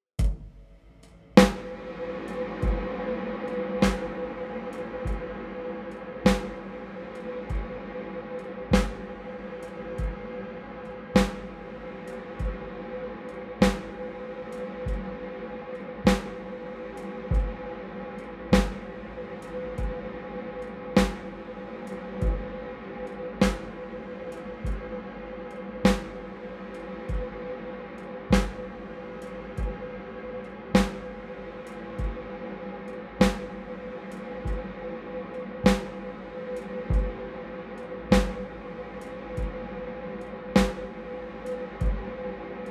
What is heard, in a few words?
Blackhole VST Quasar Trails Drum